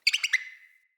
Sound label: Freed Hare Call; Encounters; Animal Rescue